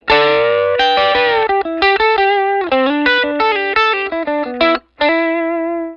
STRAT BRIDGE WARM DRIVE OVERDRIVE LICK, S pickup set